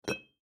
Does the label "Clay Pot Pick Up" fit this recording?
Yes